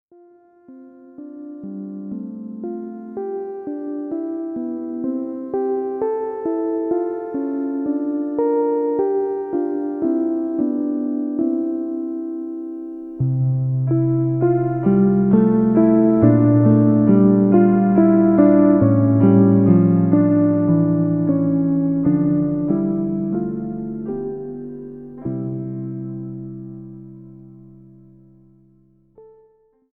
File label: Prelude in F major, Keyboard Studies